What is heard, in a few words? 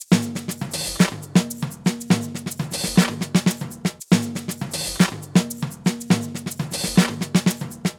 120 Tops; Ghosts